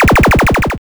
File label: Laser